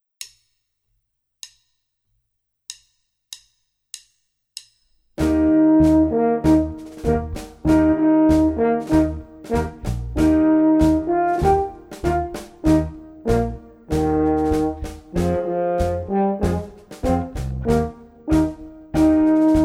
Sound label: Tango staccato